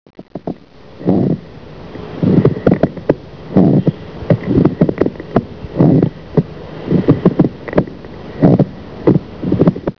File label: breath